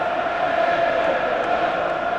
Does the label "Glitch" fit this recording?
No